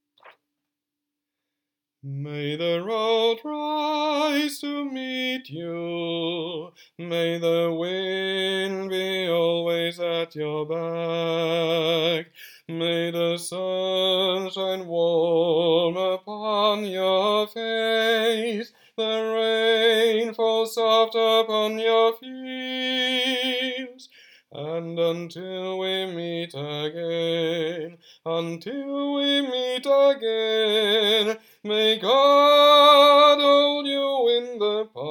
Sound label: An Irish blessing - sopran